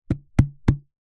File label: plastic tub empty tap base hand drum rhythm; foley